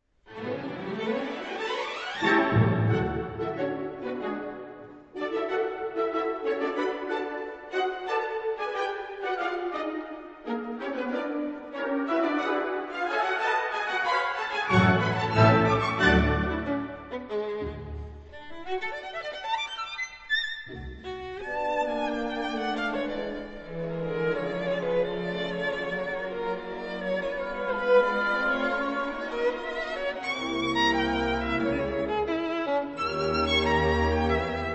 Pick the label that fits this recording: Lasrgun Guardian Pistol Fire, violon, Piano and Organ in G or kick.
violon